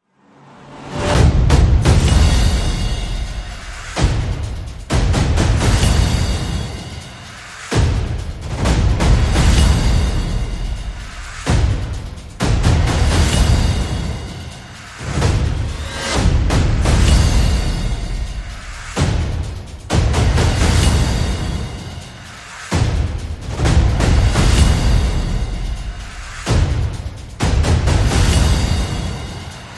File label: Percussion and FX